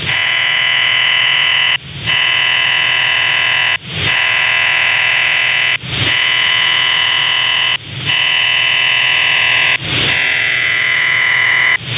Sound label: jammer